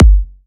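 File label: Kick, G minor; Sample Banks; Percussion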